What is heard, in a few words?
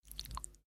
slime; Adventure Time - Elemental; ThrillGames